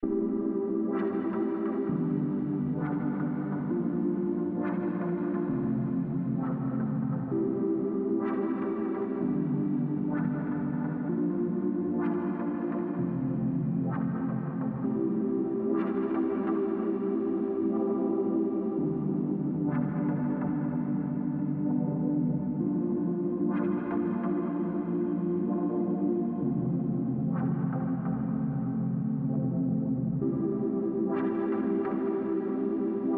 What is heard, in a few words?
Bouncy Synth